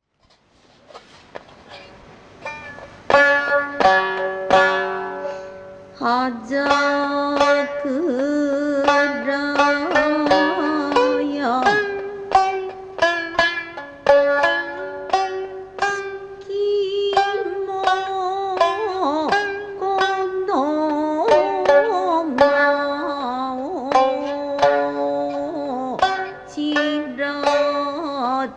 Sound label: Shamisen